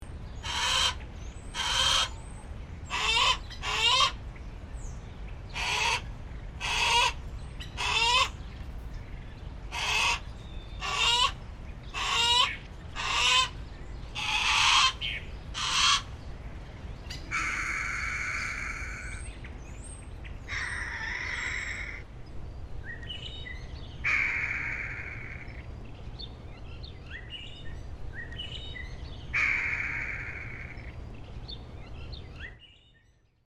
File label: harris hawk medley